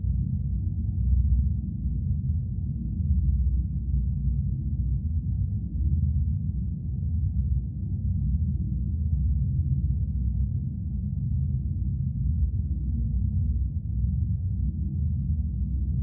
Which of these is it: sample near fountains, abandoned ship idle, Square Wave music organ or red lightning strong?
abandoned ship idle